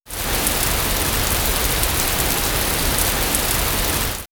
Nature Drizzle; X-Static Goldmine

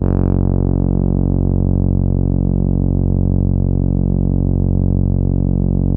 MGTAURUS F#1

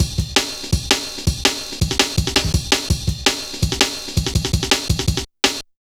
Rollin' Ride, Jungle Warfare, Drum Loops, 165.5; Zero-G Jungle Warfare 1, 2 & 3

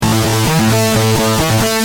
G Pump, Guitar; Industrial

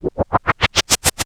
Windy; Jungle Warfare 1&2

